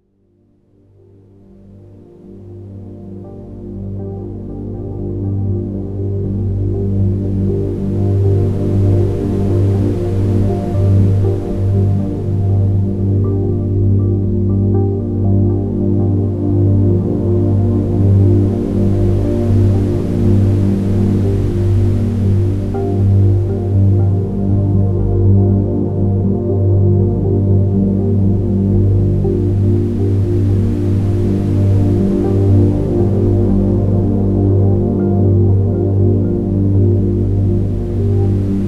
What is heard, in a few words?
brainwaves, binaurale beats